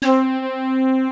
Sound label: brass, D-50; Greytsounds